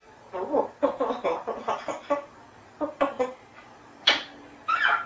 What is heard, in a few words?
wahaha